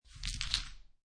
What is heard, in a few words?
Dice Grab Collect Five Dice From Felt; Casinos